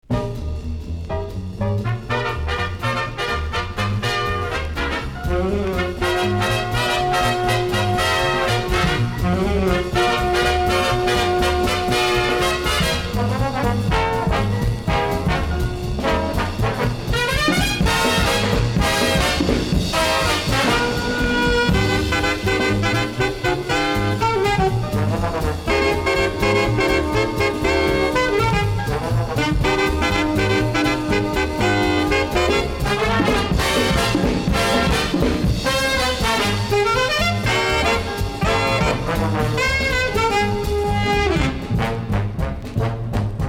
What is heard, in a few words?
Instrumental; Portland